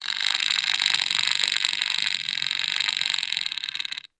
Snake, King Rattles. Snake Shakes Its Tail. Close Perspective.